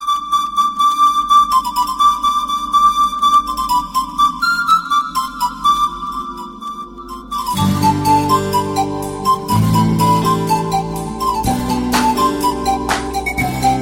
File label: Best Flute Ever